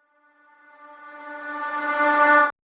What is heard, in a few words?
Verse REVERSE FX, 150BPM; Vengeance Samples